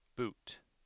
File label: boot